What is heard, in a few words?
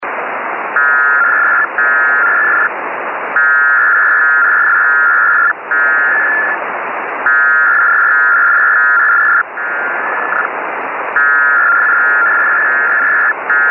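Parkhill Bursts, HF Radio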